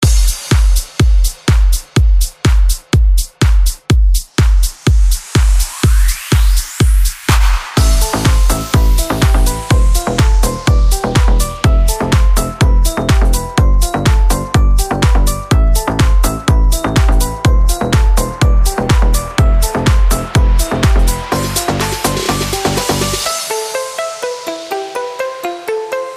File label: future house redrum 124 bpm